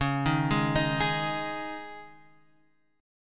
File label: C sharp Suspended 2nd C sharp, chord; arpeggiated